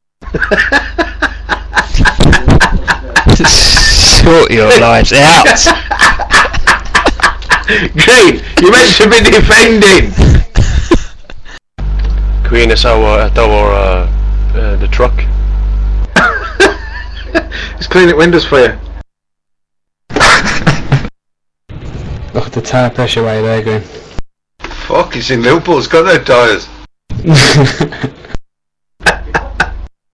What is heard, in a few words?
cleaning windows